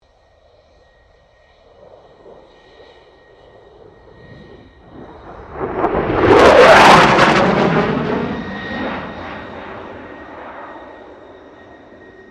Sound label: 2009 FA-18E SUPERHORNET PASS; RAAF FA-18E Super Hornet; Airplanes (Sound Effects:Vehicles)